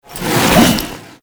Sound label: Fortress Heavy Melee Dash; Warframe; Grineer Nightwatch